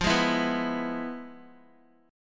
F Minor Major 7th Flat 5th Guitar; FmM7b5 strummed; JGuitar

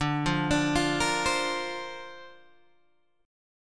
D-Minor 7th Sharp 5th D Guitar Open G, chord

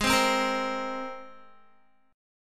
Ab Major Add 9th Ab Guitar; Abadd9; strummed; 15,15,12,15,12,x

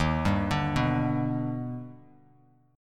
D sharp Augmented Major 7th, Bass Standard; arpeggiated; D#+M7; 11,10,12,4; JGuitar

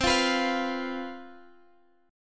C Major 7th Suspended 4th, Guitar, Standard; strummed; CM7sus4; x,x,10,10,12,13

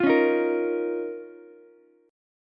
Eb 7th Suspended 2nd Eb, Ukulele; 10,13,11,13; Eb7sus2 strummed; JGuitar

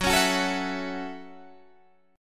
Gb Minor 7th

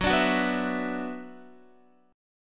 Gb 7th Suspended 4th Sharp 5th Gb, Guitar; Gb7sus4#5 strummed

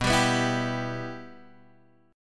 B Suspended 4th Sharp 5th, Guitar, Standard; strummed; Bsus4#5; JGuitar

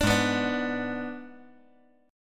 Ab Diminished Ab, 5G, 4C, 5E, 5A

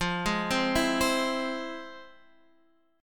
F Minor Major 7th, chord

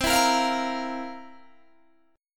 C 11th, C, down, Guitar, DADGAD

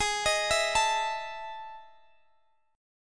E Major 11th chord

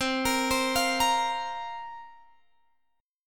C 7th Suspended 4th, Guitar, Open G

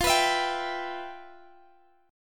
G 6th F; G6/F strummed

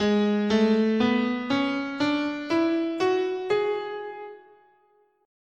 Ab Locrian, scale

